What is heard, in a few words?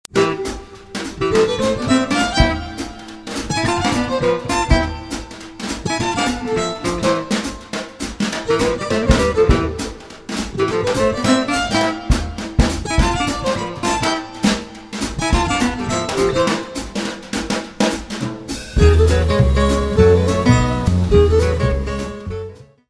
Swing